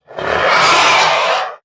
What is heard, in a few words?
Dread Raven Attack